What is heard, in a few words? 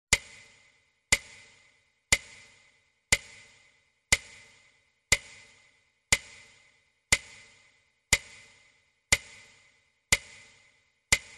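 metronome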